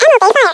All Warning

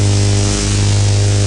PPC Explosion

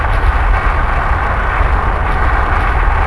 Death

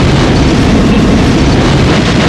Mech Explosion

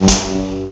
Laser A Fire

